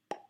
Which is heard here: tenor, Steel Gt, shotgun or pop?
pop